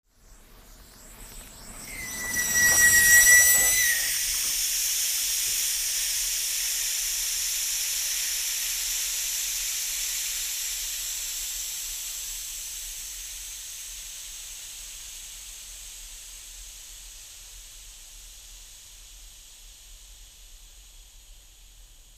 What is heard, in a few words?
Car Tire Flat Fast St